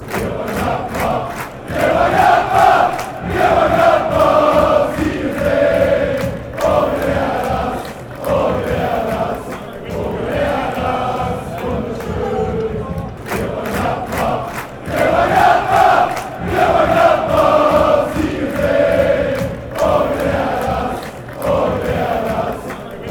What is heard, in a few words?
chant, moenchengladbach